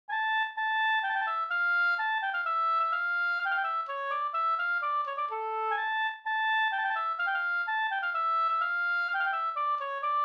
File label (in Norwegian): springar; Jenta pa Naset e Laurits Tangen Austmarka no